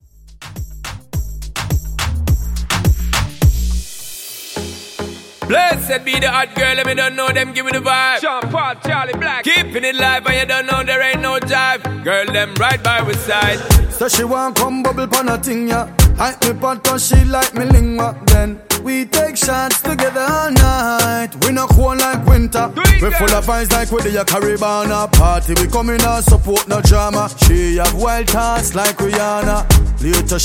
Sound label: Latin Redrum; Remixfreakz